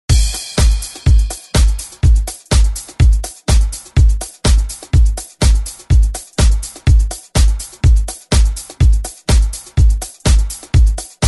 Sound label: 80s ReDrum; DJ Mhark